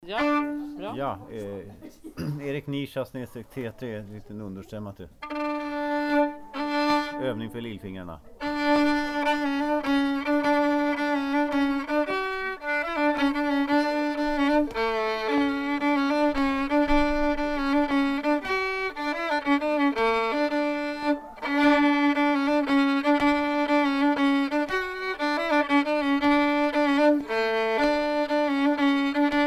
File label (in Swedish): skaravapolska stämma